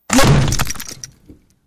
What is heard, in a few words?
Bullet Impacts Hitti, stone; Bullet Impacts Hitting Masonry With Whir In And Debris